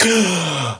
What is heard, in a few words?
gasp; SINDEMO; player; blade